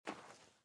step sand

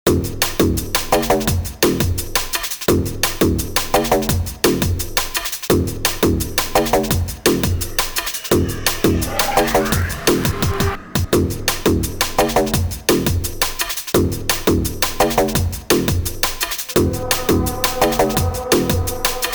Bleep Tune; Urban Chaos

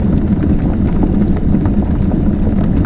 FIRE Hot Furnice Smooth loop mono; Massive Cleavage vs. Zombies Awesome Edition

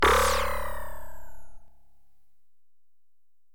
launchers; game rip; lego ninjago rise of the snakes

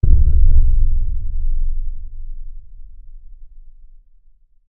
Subwoof; chivalry medieval warfare 2012 pc

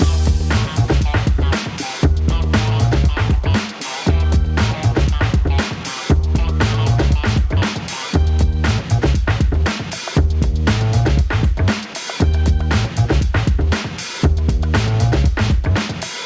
90 hiphop 118bpm; hot lava; ios macos windows

